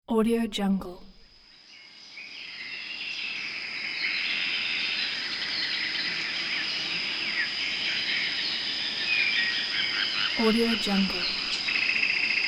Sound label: exotic birds